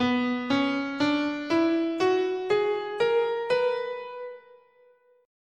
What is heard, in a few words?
B Melodic Minor, scale